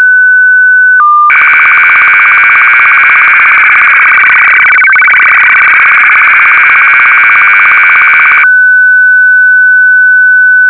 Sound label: signal